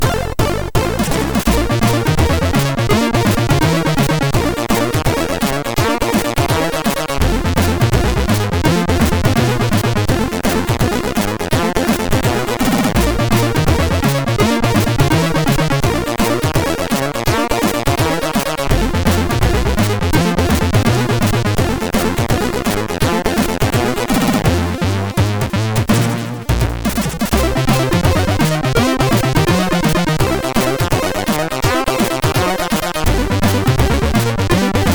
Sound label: Menu, sid MOS6581R3; hvsc